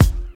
Clicky Bass Drum Single Hit C Key, Royality free kickdrum tuned to the C note.; 90s